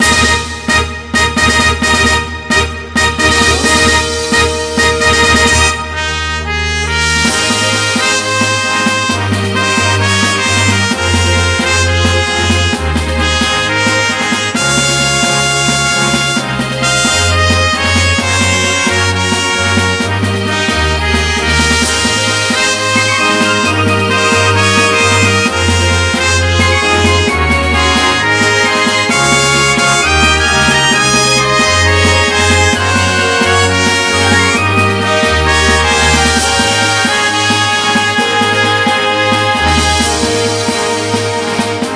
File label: Rpg Loop